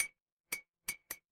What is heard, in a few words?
glass clink